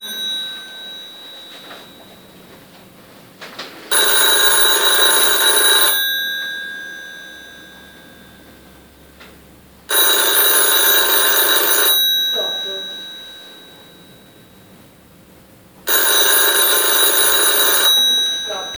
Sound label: mobile ringer recording; Opis-60s